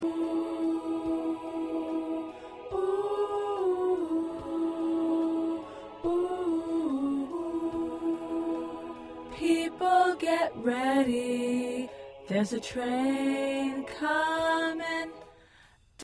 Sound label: SATB Alto